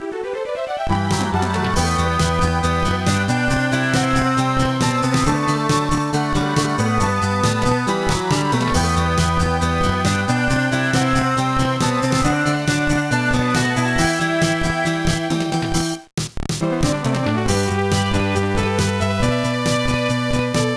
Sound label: BGM; Shin Megami Tensei Devil Children Yami no Sho; GBA